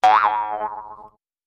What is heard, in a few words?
Jews Harp Vibrato, boings